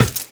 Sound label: Run Glass, Footsteps; Warframe; Tenno